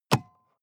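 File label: sports car interior compartment close medium; verkehr transport; autos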